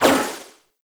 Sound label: Guardian Shell Impact; Warframe; Focus; Vazarin